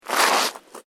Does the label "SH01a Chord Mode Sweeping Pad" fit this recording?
No